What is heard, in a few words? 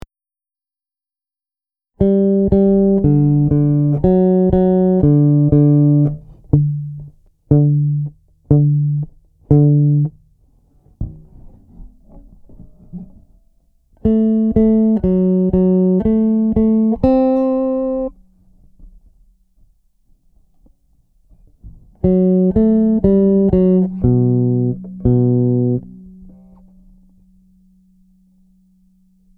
SKIP CLIP VIOLIN II